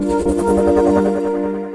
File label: Missions Complete; Breakneck